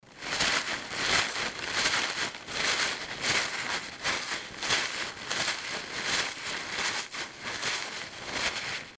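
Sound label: sound of rustling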